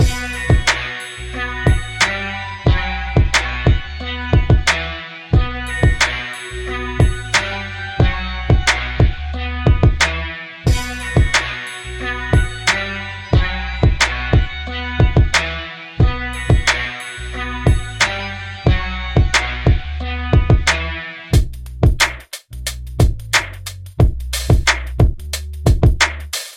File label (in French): musique; AirJordan; MUBERT; Musique générée avec l'IA